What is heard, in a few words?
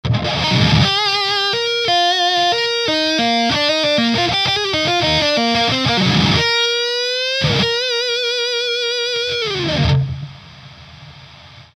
Solo SM7 Off Sharp, Ibanez Guitar Effects Pedals